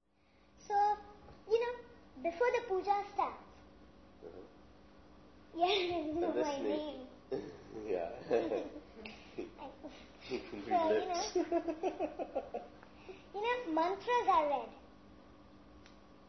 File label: Fusion Music Raga; RKC Live Stream; Travel India